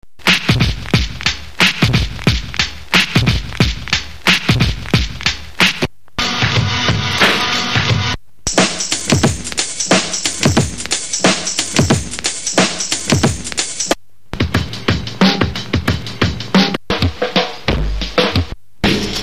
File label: hiphop beats DJ elements; msx